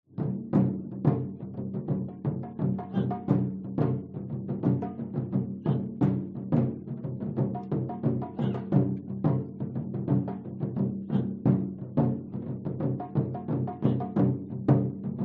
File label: musical taiko drums performance chants japan